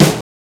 MED SNARE, Fairlight CMI, DRUMS

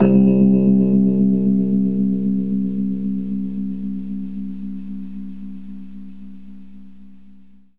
Rhodes fast C; 3D Sound; 3D Keys VOL-1

